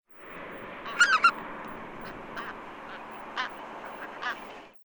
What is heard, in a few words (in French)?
oie rieuse, chant